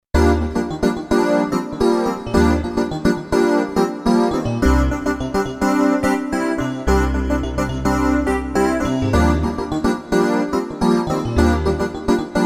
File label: breathing piano